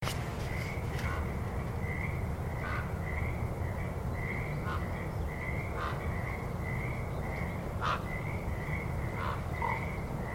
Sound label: Frogs at Maplewood; birds